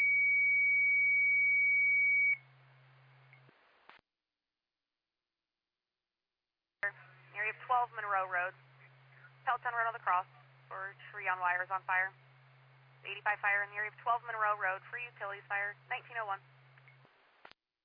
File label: Siren; Lafayette FD